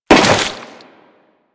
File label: Crewman Step Goo, Footsteps; Warframe; Corpus